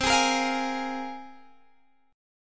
C 7th Suspended 4th Sharp 5th, C, down, Guitar, Drop D